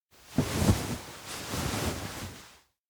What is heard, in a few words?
futon struggle get up; anemoi